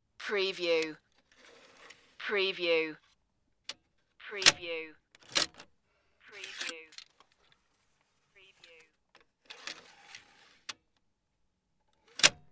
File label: car tape deck